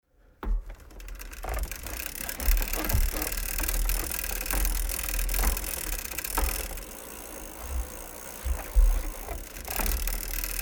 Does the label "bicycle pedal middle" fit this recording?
Yes